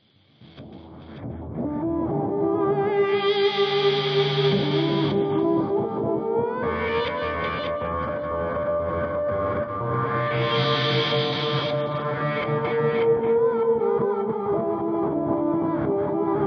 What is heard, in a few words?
guitar fx test